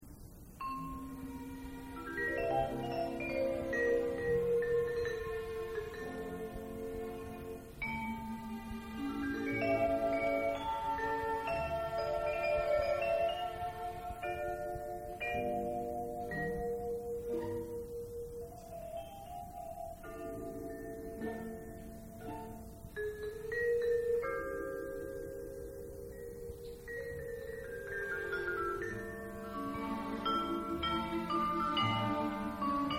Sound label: czardas, mallets